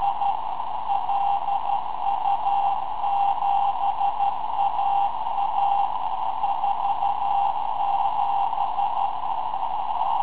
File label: baliza cw